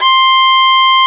Violin Gb4

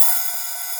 Open High Hat

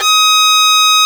Violin A4

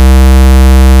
Square Wave C1

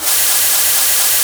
Brush Snare Swirl

